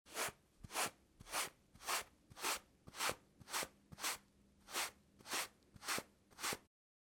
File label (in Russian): zvuk gubki